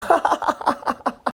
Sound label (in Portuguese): risada